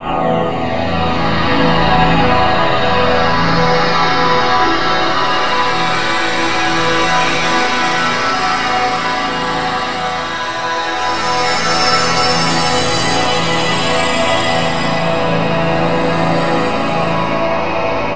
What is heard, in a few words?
HUMAN PAD; USB Soundscan vol.33; AKAI; Disjointed Textures; TRAVEL